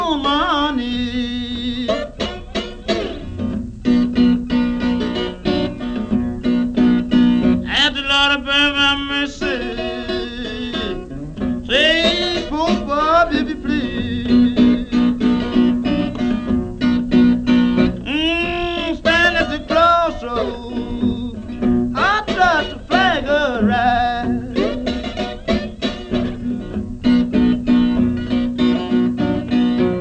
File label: blues